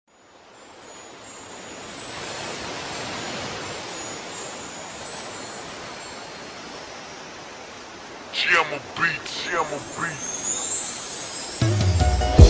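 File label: g-funk r&b beat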